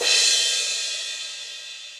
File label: Crash 18 Old School, Cymbal; Drums; Drum Hits; Standard Hits; Session Drums Studio